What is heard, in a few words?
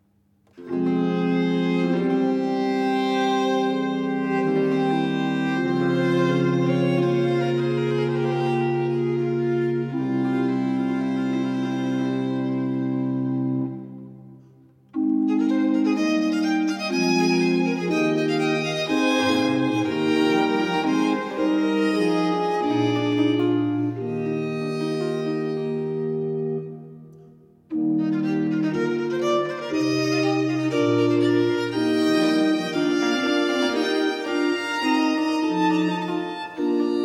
Sonate 5 in B flat major - 3. Adagio-Allegro; Archangelo Corelli; Corelli - Complete Works